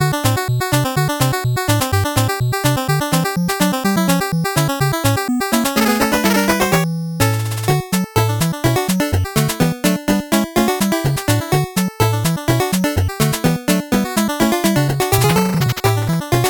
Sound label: 8bit bgm normal